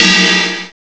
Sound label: SHORT CYMBAL; CYMBALS; CMI Sound Library Rev 1.3